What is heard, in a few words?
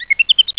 powerup, Pacman